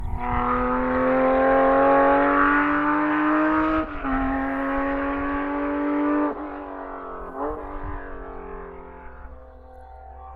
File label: Porsche 911 GT3 Cup Car Racing In The Distance